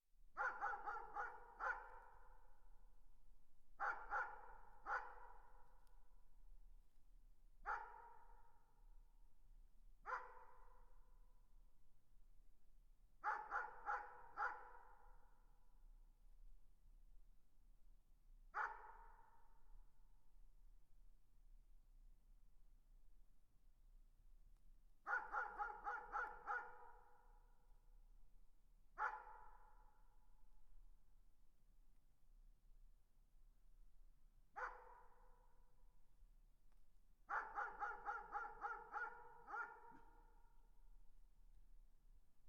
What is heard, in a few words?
distant dog barking